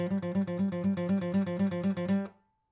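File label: acoustic pattern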